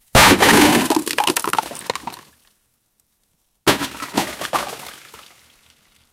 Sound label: throwing away and destroy